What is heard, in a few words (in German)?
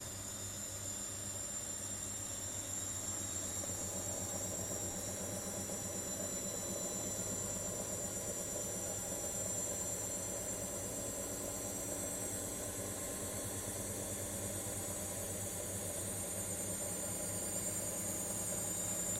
Schleudergang Waschmaschine